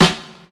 phat steel snare drum sound f key, Royality free steel snare drum sound tuned to the F note.; snare-drum-samples